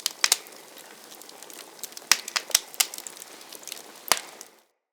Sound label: Campfire Crackle; Props